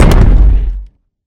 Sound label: Footstep Exosuit; Armor; DynamicEuropa